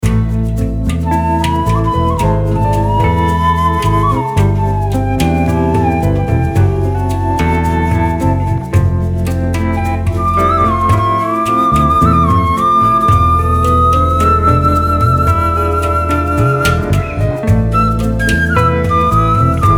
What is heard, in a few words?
Bansuri